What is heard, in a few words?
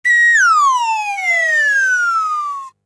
whistle down long; sonniss gdc